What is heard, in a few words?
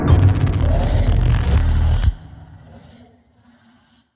take damage; Watcher